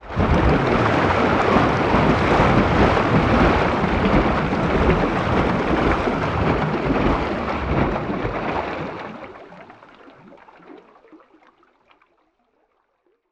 Underwater Water Object Movement Splash Gurgle Bubbles Long